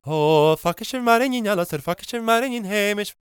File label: GAELIC, World Voices; ILIO; Vocal Planet